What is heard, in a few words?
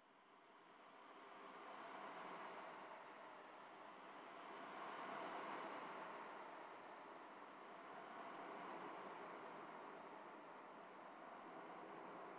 noise